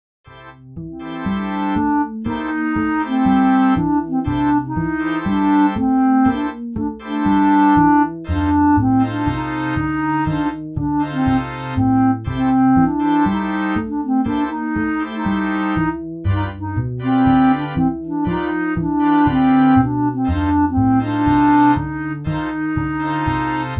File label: MIDI Blues; spellman studio